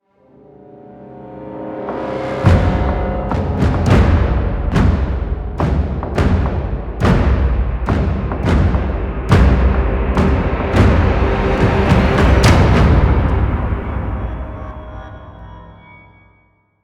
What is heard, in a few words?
Cinematic Equip Cue, Music; Warframe; Cinematics; Archwing